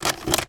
household cd case remove from full rack; sound effects one

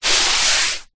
industrial paint brush long single stroke; zapsplat

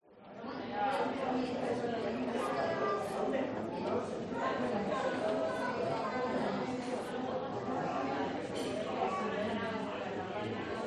SPANISH CROWD Cafeteria 20 25 People Adults Kids; gain walkers

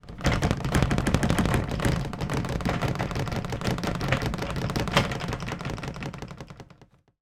foley storage boxes plastic shaking; zapsplat

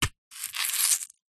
horror stab body twist knife

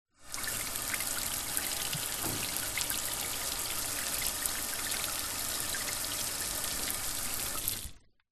household shower outside on off

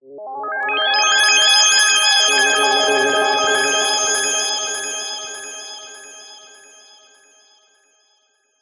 fantasy magic twinkle slightly mysterious

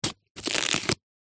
horror kitchen knife stab body twist rip; zapsplat

